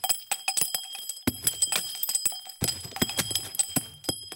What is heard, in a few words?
shells 20mm common loop; guns; tanks; War Thunder